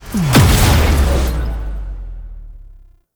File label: Exalted Sniper Cast Impact; Frumentarius